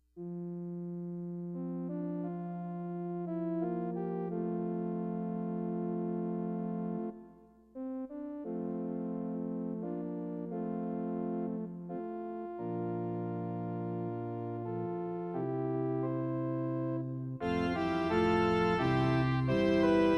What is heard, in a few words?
Antiphon Faster, choir antiphon liturgical; Epiphany